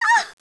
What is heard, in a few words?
Heroine Hit